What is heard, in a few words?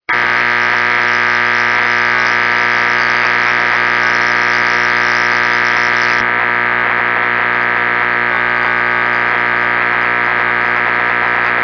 6m noise